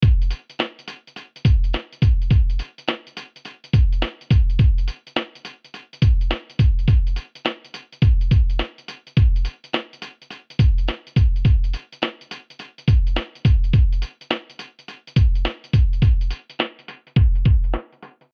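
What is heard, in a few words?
R&B Hip drums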